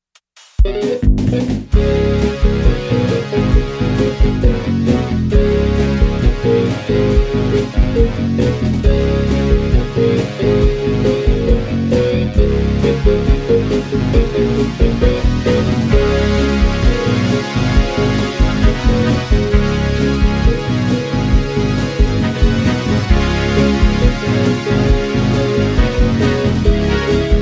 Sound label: strings disco